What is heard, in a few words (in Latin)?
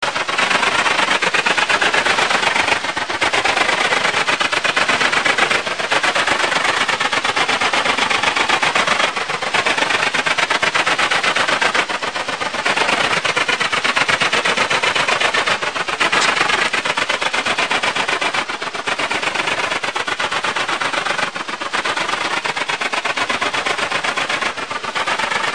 tettigonia viridissima; biologie